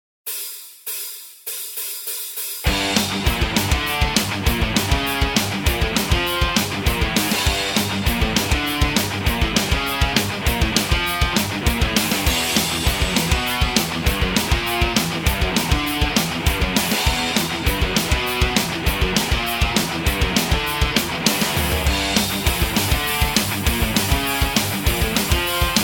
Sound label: bass metal, backing tracks